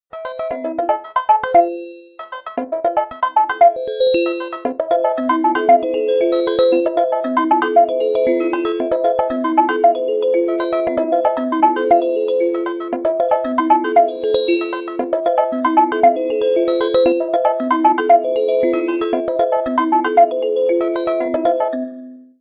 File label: Cowboy Ringtone; Android